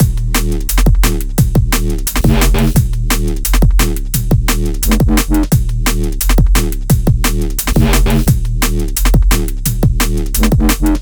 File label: DNB